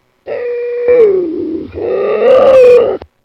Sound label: bobcat sound